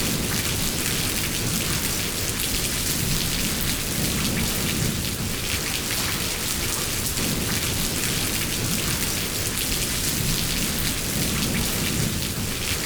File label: Rain Waves